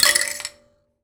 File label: Finger Hit, Prepared Kit; Battery 3 Sample Kits